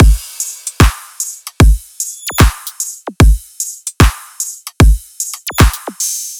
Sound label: Drum Loop 150 Full; Loops; Ghosthack - Color Bass Essentials